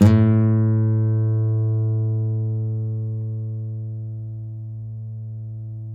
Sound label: FLAMENCO NYL, Guitars; Spectrasonics; Hans Zimmer Guitars VOL-2